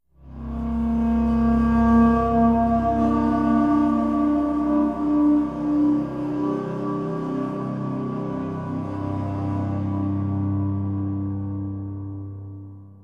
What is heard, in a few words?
tonal; Myst III Exile